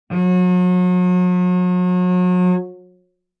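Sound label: Cello arco fortissimo sul G F3; MIS Pitches - 2014; Strings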